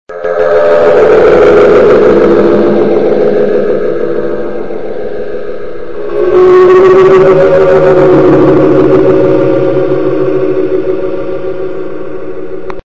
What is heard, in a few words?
earthquake